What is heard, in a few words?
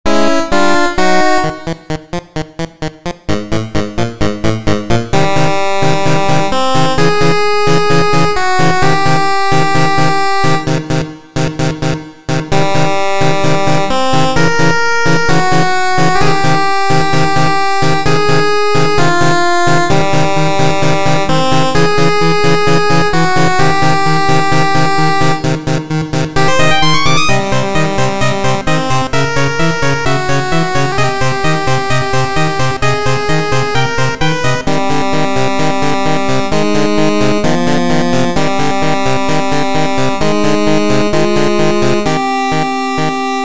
8bit surround